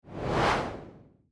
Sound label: swoosh